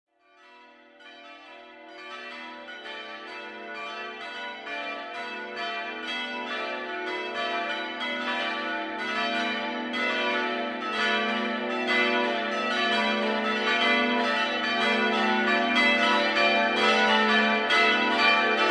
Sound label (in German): Glocken